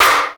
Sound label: attentive, snares real